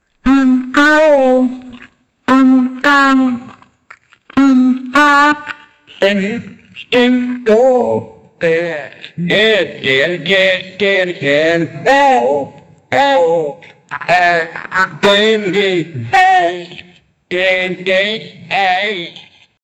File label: a man singing these lyric, children's songs